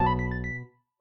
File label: combo power